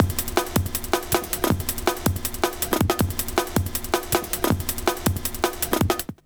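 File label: jungle loop, 160 bpm; Explosive Jungle; USB Soundscan vol.05; AKAI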